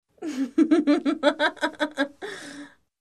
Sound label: Female Villain Laugh